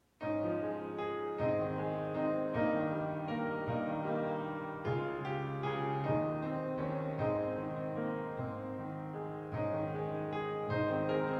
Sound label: Piano